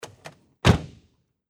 nissan door open and close

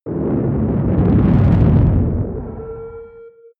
spaceship flyby load warp; raumschiff

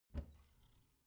bathroom cabinet door creak open soft

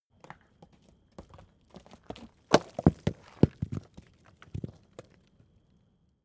stone large fall; natur-tiere; steine

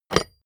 metal small scratch wood; industrie wirtschaft; metall

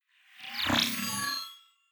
swell short metal string computer; sweeps-swells

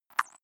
click synthetic squeak soft, signale

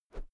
whoosh thick slow soft; swooshes-swishes

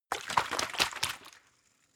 bottle water shake short; berufe

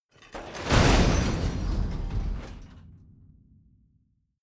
metal long pieces, industrie wirtschaft metall

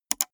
gaming mouse left click single fast

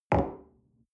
coffee place table soft short, restaurant cafe

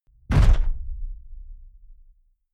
sci-fi gun mechanic fire internal; pistolen gewehre

